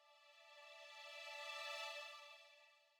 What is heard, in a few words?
Loading (Jingle)